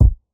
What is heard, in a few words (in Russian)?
bass bochka tihaya gluhaya s metllicheskij otzvukom